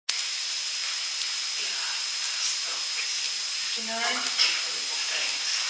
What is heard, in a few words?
evp amplified; epsom schoolhouse; terrible things